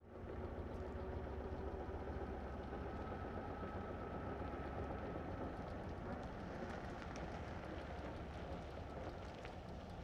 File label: 1937 Plymouth Ext In & Stop; Period Vehicles